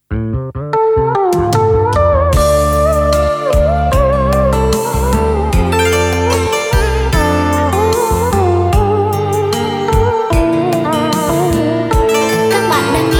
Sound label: beat, playback